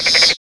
monster death; alienshooter

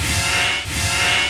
feed, buttons; digilab

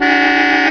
horn, ambience; blackops

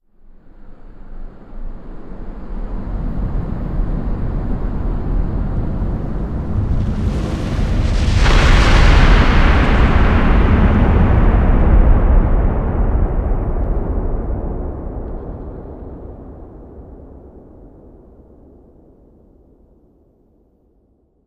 distant demon attack; st mission; hs c4

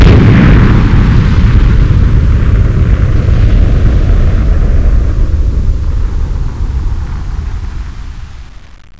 nuke explode; ambience; blackops

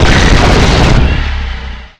large beam start; st mission

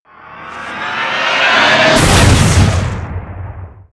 alien spawn; vendetta

